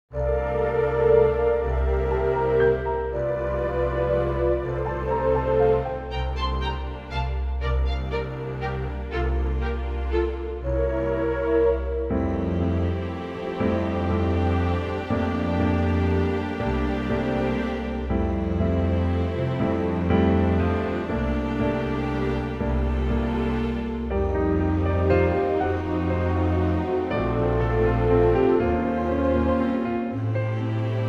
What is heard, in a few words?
orchestral Bb verse incl; key - Bb - vocal range - C to D; Wonderful orchestral arrangement of this superb standard from the MGM musical "Meet Me In St Louis"